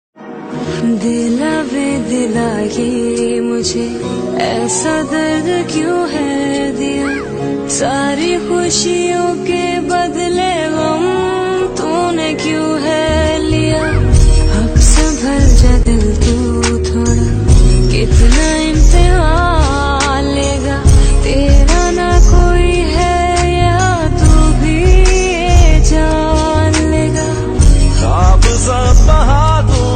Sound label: Pakistani ringtone